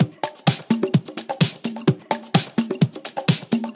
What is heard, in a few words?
Percussive Loops 128BPM; Vengeance Samples